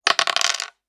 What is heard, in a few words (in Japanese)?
サイコロを振る 二個; ボードゲームやカジノ、麻雀の効果音です。